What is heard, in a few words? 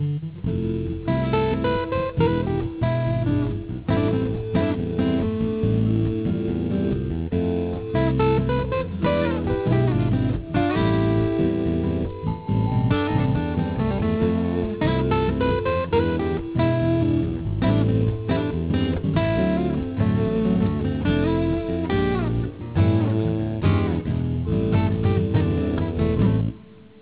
flamenco